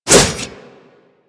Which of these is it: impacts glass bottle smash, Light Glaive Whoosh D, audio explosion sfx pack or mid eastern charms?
Light Glaive Whoosh D